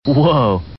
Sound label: whoa